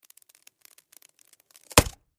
Styrofoam Sheet Crac, plastic

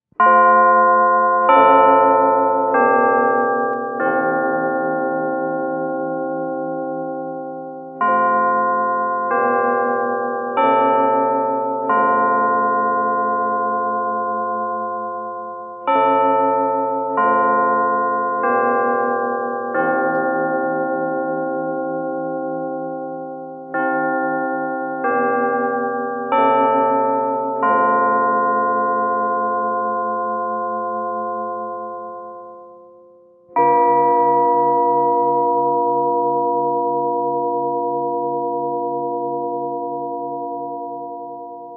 Lg Westminster Clock, bell towers

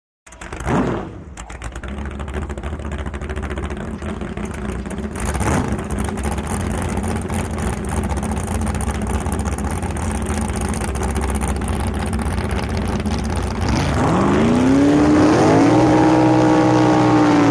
Drag Boat Start revs

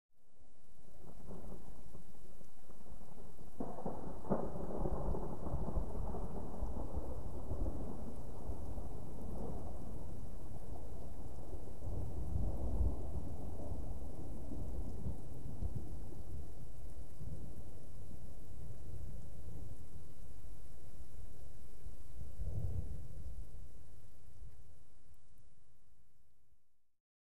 Thunder Gentle Thunder, distant

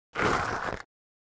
Object Dragged Acros, rocks & dirt

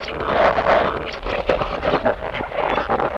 Rubber Wet Suit Remov; rubber mvmt

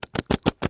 Staged Tire Blowouts

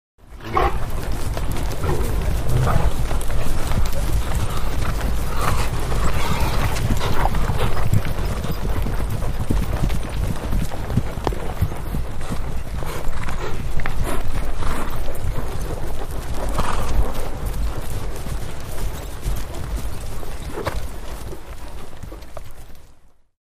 50 Horses Various Pac, group

